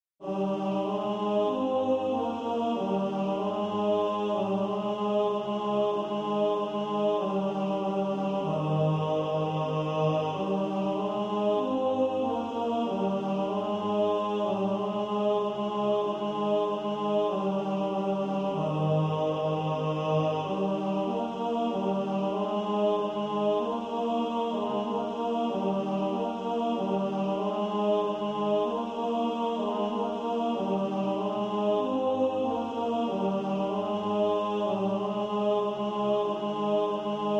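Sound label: tenor, practice music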